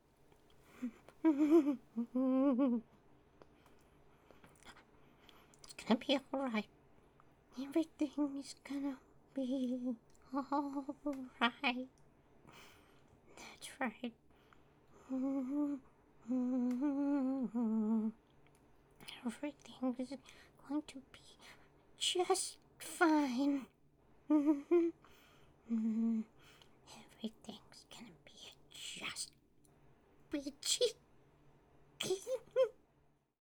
Male Idle; a dump in the dark

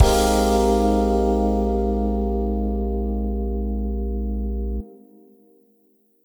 Retry Jingle

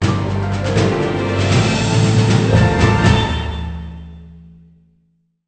Sting Battle Intro

